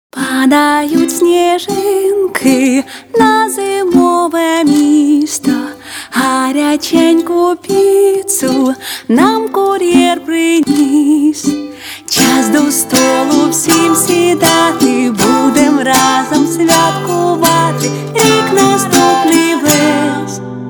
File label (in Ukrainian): Ролик укулеле гитара